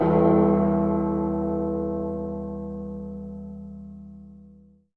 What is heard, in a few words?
bell tower; Bells